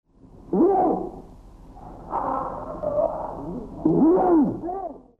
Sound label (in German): Seehund Phoca vitulina; Tierstimmen